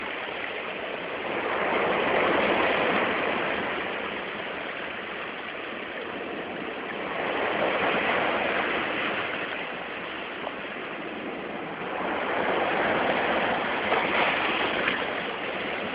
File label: sea sound; ringtones